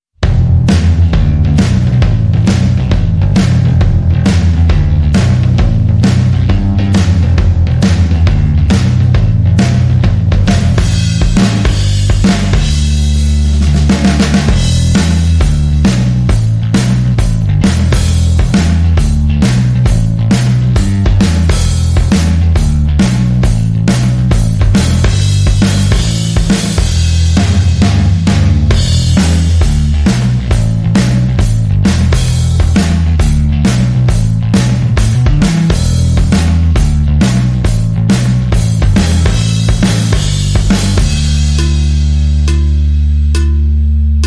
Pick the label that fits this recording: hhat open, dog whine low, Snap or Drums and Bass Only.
Drums and Bass Only